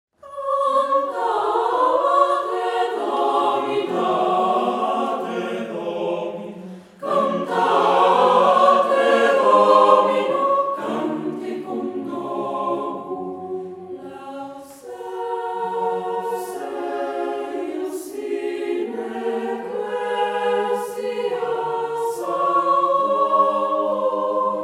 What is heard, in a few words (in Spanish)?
Coro Juvenil, Cantate Domino; CD 2 de la Música del Centenario; G. F. Anerio